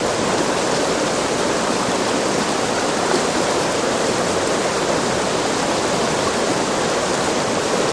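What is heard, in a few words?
River Fast Loop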